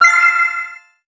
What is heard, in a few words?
FEVER UP; Puyo Pop Fever